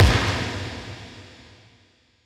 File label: Impact